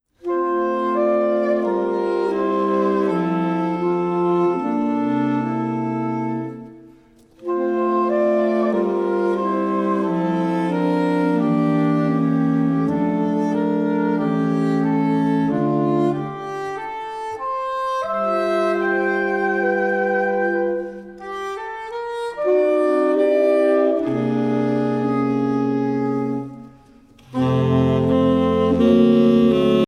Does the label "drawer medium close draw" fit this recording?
No